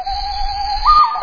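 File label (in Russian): лес ночная кукушка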